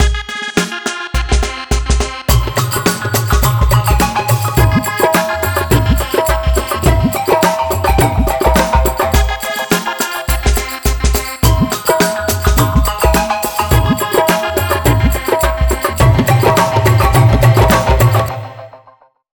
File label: Trance; Sony Ericsson; PlusFourSix